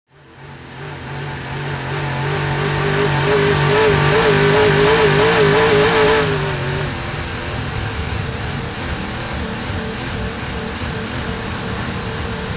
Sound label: Boat In Out Race, motorboats